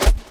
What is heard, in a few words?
wheel stop; Fruit Fiesta; Wazdan